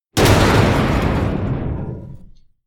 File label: Heavy Hard Metal Resonant Impact